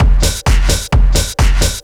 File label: techno, DRUMLOOPS